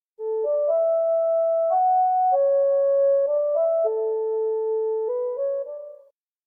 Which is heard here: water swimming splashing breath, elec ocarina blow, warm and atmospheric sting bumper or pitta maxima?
elec ocarina blow